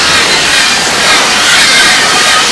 wormhole

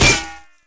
hit heavy metal; weapons

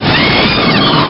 hiss, alien

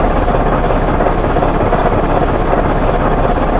tank idle

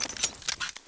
colt reload clip out; weapons

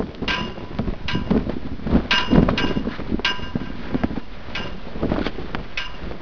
flag sound

